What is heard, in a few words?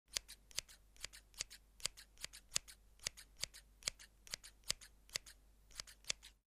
scissors